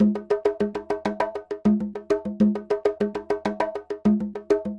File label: Conga Groove, Latin Percussion